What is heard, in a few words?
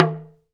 TALK DRM, Heart of Africa; Spectrasonics